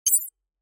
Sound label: hover